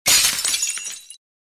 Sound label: Window breaking into shards